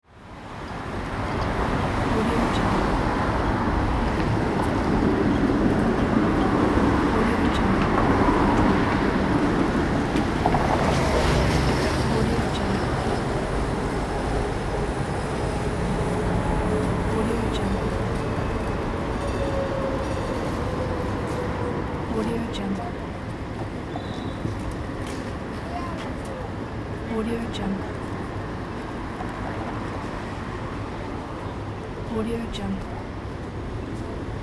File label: city noise